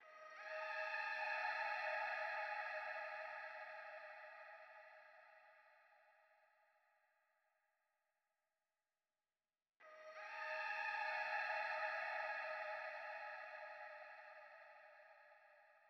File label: Vox Loop C-m